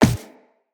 Walk Default, Footsteps; Operator

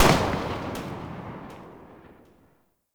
Viper Fire Tail, Weapons; Warframe; Vipers

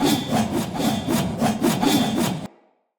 Great Sword Recall Loop; Weapons; Player; Swords; Mendicant Great Sword

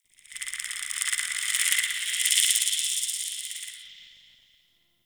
clicky cave critter; Warframe; Tau

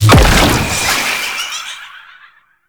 Gouache Teleport In B; Warframe; Follie

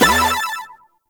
Hi Score; Warframe; Nineteeen Ninety Nine; Deep Archimedia

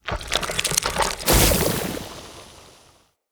Corrupted Sproutfolk Summon Burst; Enemy; Combat

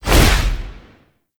Interior Orb Station Console Interact; Warframe; Tau; Orbital Station

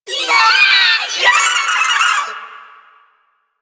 Proto Infested Volatile Runner Taunt Scream; Enemies; Abilities; Warframe

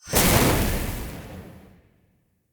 Energy Spike Border Start

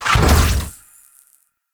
Execute Teleport; Warframe; PaxDuviricus

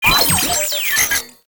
Sniper Drone Vocal; Enemies; Warframe; Lasrian

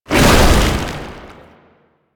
Tank Infested Charge Launch; Lasrian Tank; Abilities

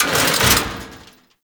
Shelter Door Open; Tau; Grineer; Doors

